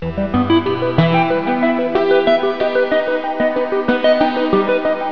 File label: Romantic; Symbolic Composer; S-COM Music Samples